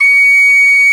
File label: P WHISTLE, Old World Instruments, Winds; E-MU Producer Series Vol. 7 – Old World Instruments